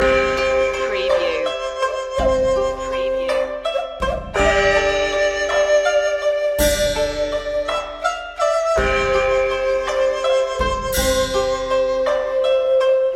chinese waltz loop

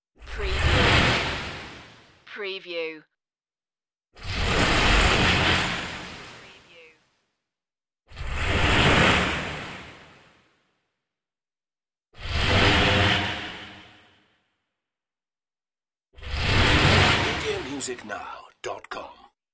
space transform swooshes

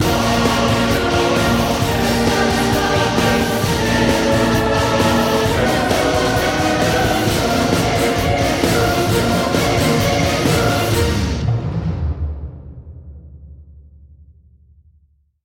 rock mix

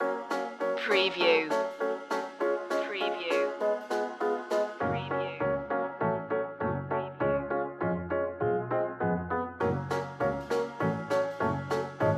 bugsy bop loop

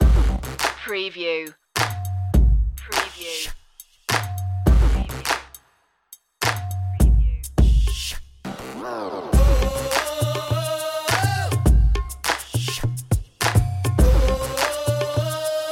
gangs at night 103bpm

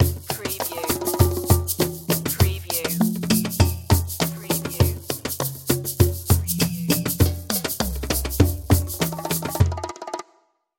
Musical; Production Element; Sound Effects Bible

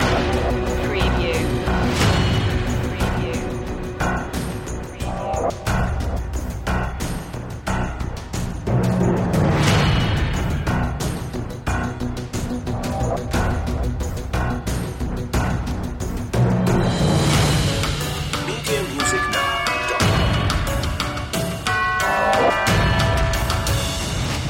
perc and synth

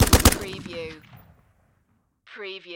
Machine Gun Steyr Aug

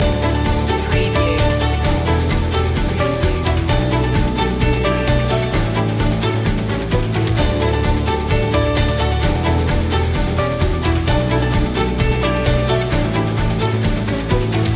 corporate technology loop

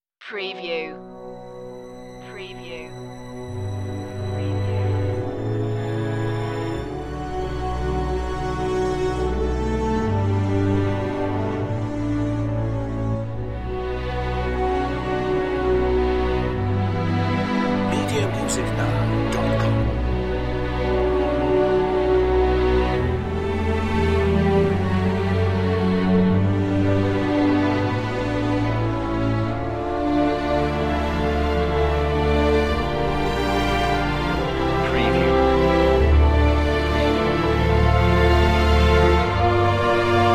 no staccatos no perc

